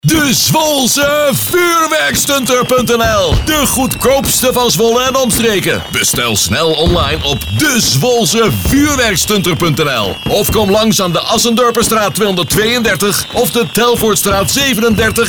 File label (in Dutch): vuurwerk